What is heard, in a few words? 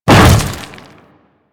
Hammer Boss Step Dirt, footsteps